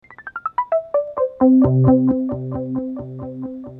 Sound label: echo message